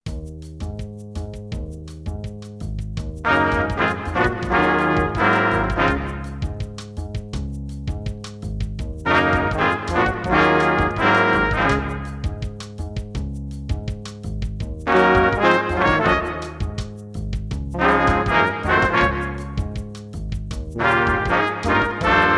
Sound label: Stage Band